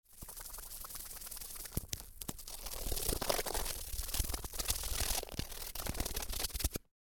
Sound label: Polystyrene, Designed, Alien, Bug, Insect, Wing, Flapping, Squeaky, Weird; Submerged Tapes; Polystyrene Destruction